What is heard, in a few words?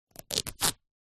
clothes rip cotton short; zapsplat; foley